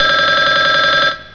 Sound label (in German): wecker